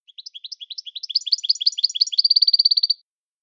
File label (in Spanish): canario; campero